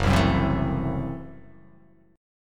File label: Gb Minor 7th Flat 5th, guitar chord; strummed; JGuitar